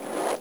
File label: Drawer Close; Newton